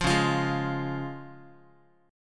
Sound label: Db Major Db Guitar; strummed